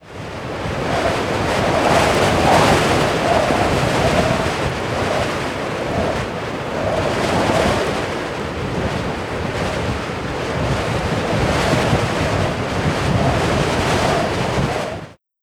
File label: Cities Wind